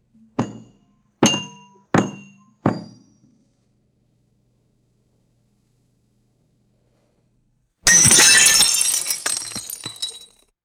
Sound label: wine glass bouncing and shattering on table